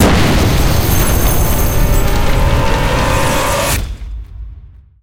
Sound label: near Win